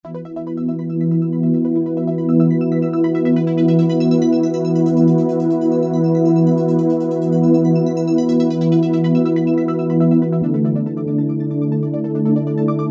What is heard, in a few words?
Stairs Pad, Korg microKORG; Factory Voices